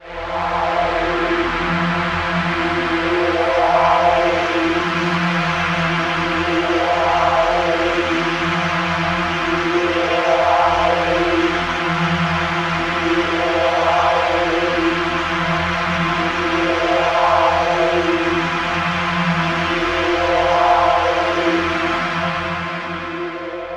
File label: Pad; neuro; InsideInfo